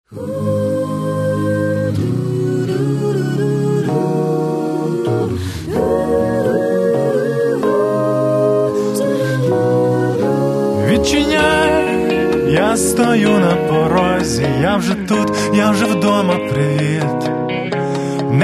jazz

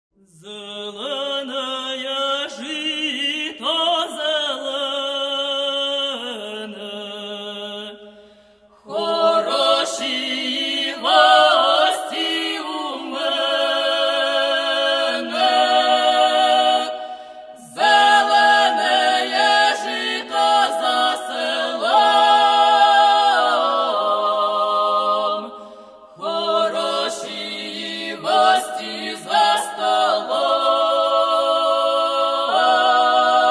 Folk Choir; Cherkasy

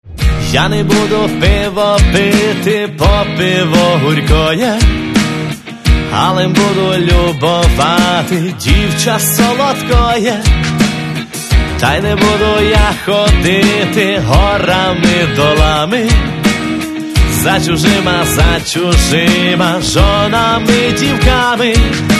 rock

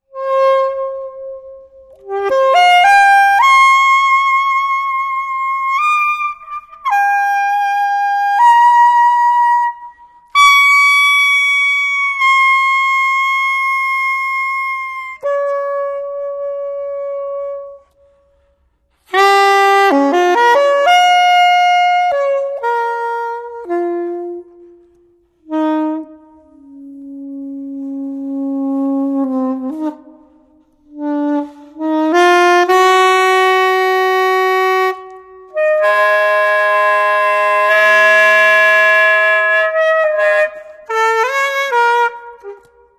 sax

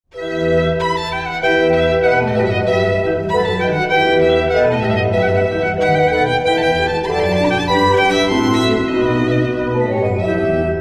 violin organ

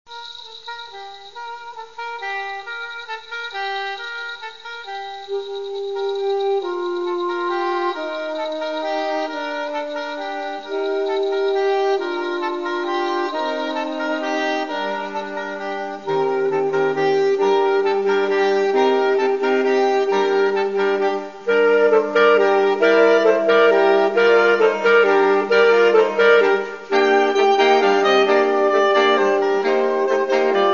sax

